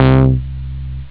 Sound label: Bass; SynthSamples2